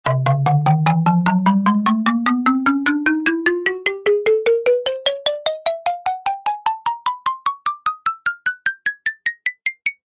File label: win count up; Orca